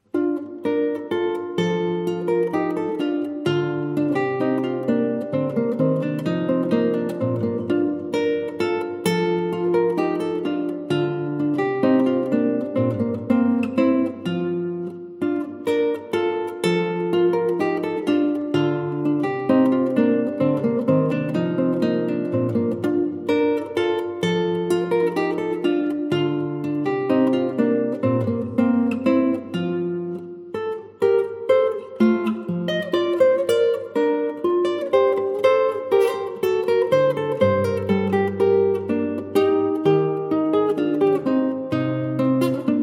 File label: gavotte; telemann